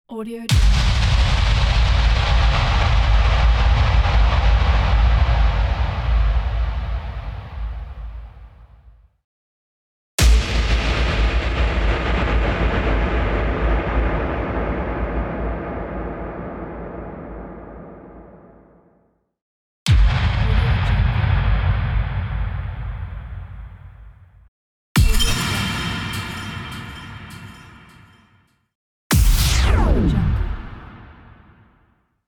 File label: hollow future hit